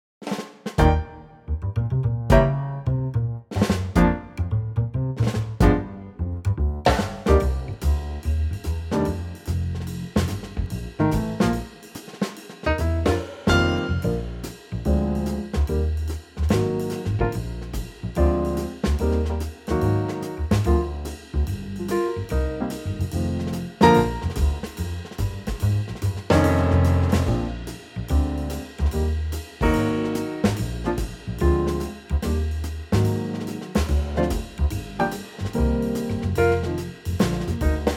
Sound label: Bb Trio; christmas